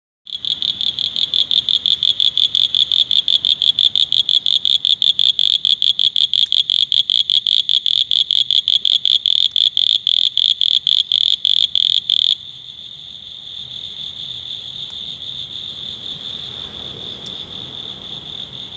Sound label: Duttaphrynus scaber